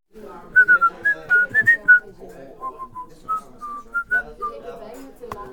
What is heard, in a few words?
whistle